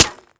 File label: asphalt small